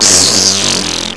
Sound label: pain; quake2; railz